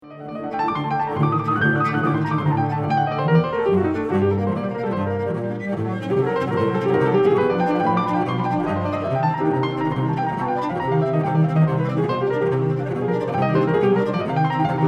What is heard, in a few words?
cello